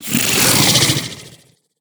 Shellshifter Stealth

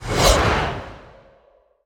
Wand Dark Attack

